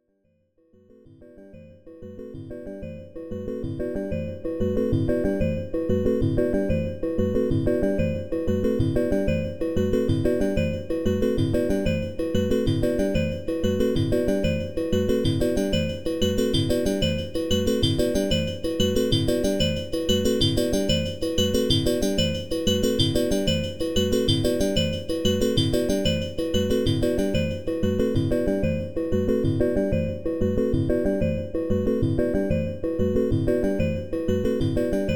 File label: pulsar automata in e minor